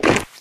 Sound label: gear, metropolice; Garry's Mod; hl2